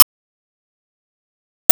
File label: Sys Timeattack Icon Rank Down